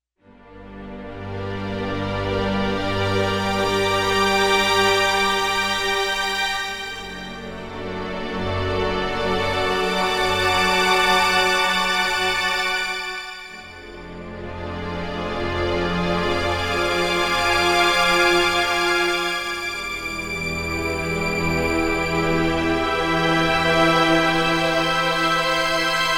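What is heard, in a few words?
Strings OCT ASCEND, synth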